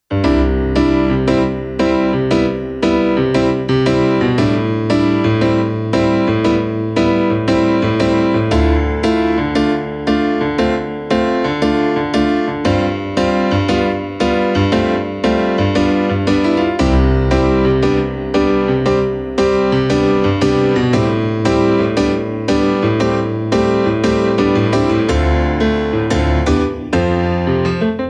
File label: vr-09 grand piano; v-combo vr-09